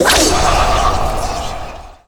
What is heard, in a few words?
Whispering Claw Strike